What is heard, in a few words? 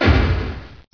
door stop; Source Engine; bshift